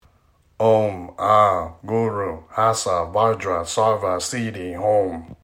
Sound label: Milarepa Mantra